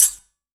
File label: Yamaha CS1x Drums